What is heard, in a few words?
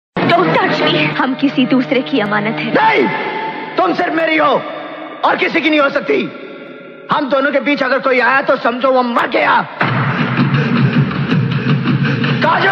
ringtone ghanti